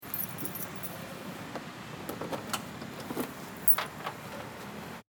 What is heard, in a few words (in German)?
Auto Schluessel aufschl.